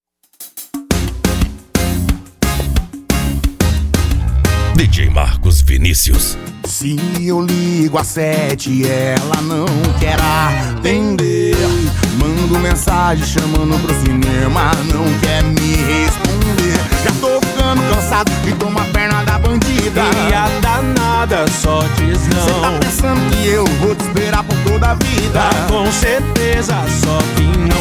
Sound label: sertanejo